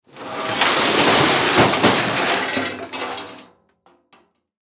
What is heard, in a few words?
Crash Metal Objects Slide Pile; Crashes; Sound Effects:Objects; Blastwave